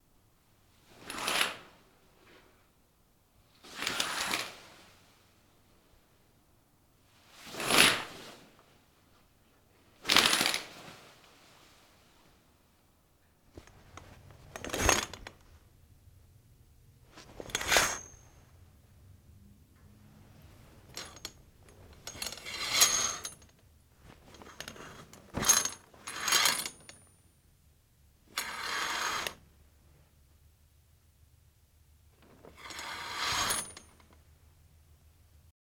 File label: Opening And Closing Curtain